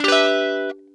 Stabz

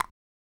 Percs

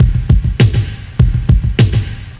Loop

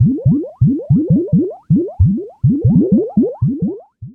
bubbles

